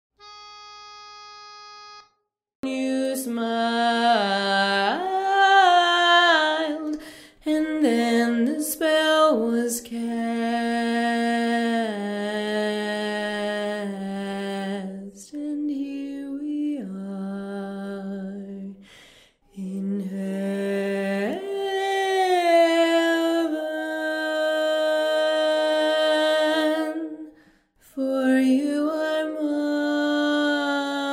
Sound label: Bass Bars 40-50